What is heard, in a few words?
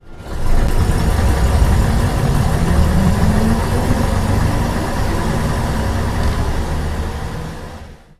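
leyland titan pd2, bus; Simutrans; Pak128.Britain-Ex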